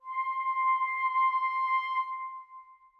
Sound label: clarinet leg C6, Woodwinds; BBC